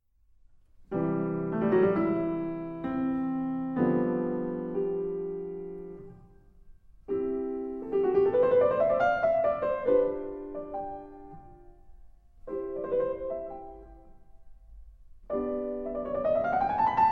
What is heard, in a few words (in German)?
Sonate